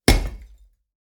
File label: Building blocks dropped on a heavy rock